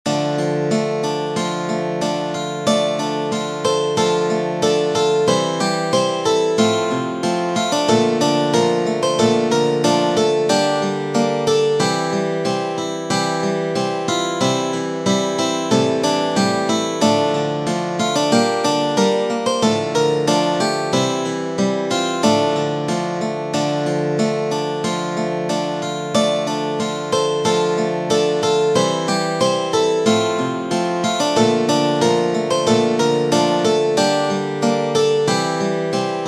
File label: guitar